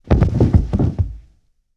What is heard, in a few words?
Body Falls on Stairway